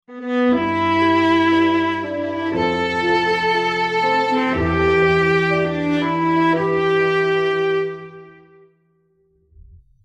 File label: Romantic Instrumental Engagement Ringtone